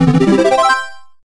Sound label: Unknown Fanfare; super monkey ball adventure